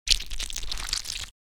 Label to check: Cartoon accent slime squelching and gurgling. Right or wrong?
Right